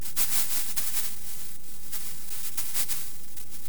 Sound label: Rustling